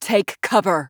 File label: Mage Taking Damage; Realm Royale; Kunoichi